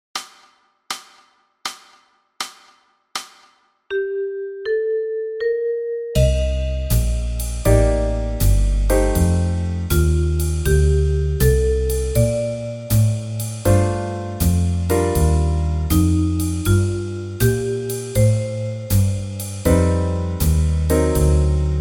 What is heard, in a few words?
Bb Bass